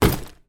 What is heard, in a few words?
handbag put down on table; foley